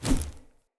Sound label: Melee Whoosh Fill; Enemy; Characters; Corrupted Sproutfolk